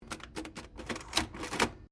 ADDING MACHINE BURROWS 1940S OPERATING, Old press button adding machine; Typing (Sound Effects:Offices)